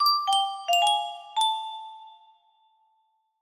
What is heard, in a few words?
music box